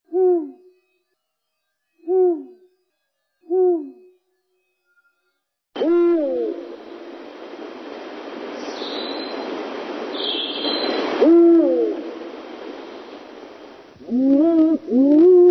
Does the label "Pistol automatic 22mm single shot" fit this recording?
No